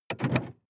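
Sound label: sports car interior trunk close soft; verkehr transport; autos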